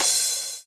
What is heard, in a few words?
CRASH, Dance Drums; Best Service; Dance Mega Drums